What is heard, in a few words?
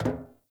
Walk Metal, Footsteps; Enemy; Mockeries; Mockery